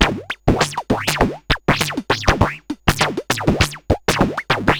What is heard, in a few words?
Moog Freqbox RX17 (100BPM), cyborg beats